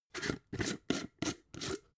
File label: jar glass plastic open